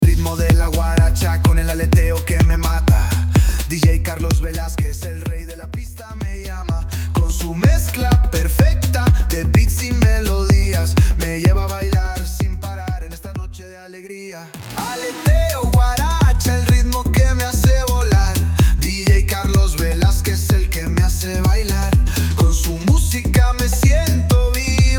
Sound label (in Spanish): Guaracha Y El Aleteo 126BPM